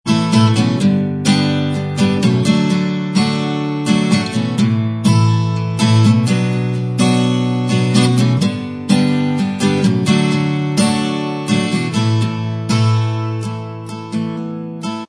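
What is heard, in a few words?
acoustic style Instrumental Track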